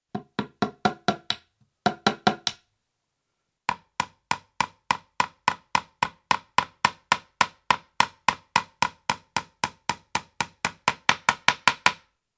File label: Tack miss-hit twice and then hammered in; Hammer Sound Effects; Tools; BBC